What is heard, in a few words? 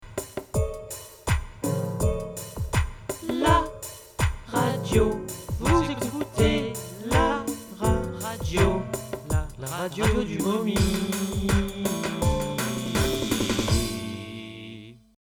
jingle